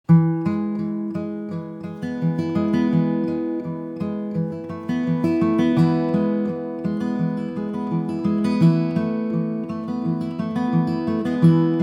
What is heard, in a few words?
Relaxing